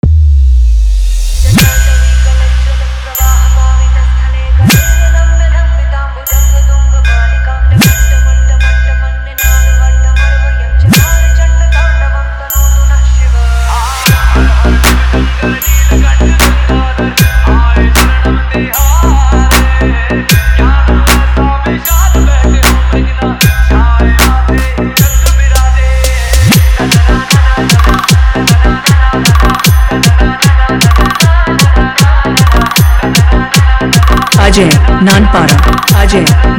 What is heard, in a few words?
Hard Vibration Bass Fast Mix